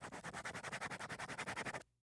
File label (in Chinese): 笔触